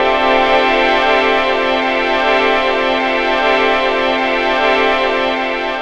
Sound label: Pads C; Zero-G; Total Drum Bass